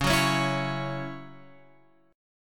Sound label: C sharp Minor Double Flat 5th C sharp, chord; C#mbb5 Chord {9 9 11 9 X 9} strummed